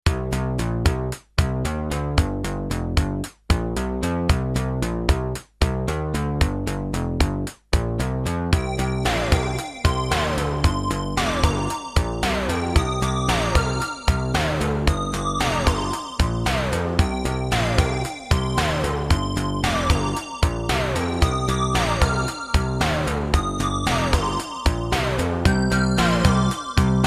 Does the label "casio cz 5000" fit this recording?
Yes